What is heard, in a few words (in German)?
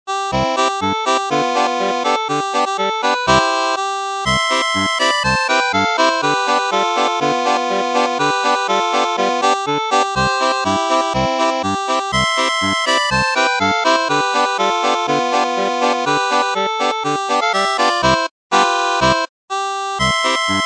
Polka, steirische Harmonika